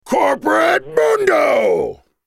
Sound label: ultimate effort; CorporateMundo; Character Voices - English